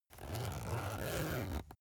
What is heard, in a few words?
zipper use